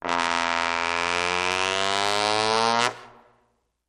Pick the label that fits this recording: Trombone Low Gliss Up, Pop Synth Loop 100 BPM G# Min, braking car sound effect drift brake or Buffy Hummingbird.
Trombone Low Gliss Up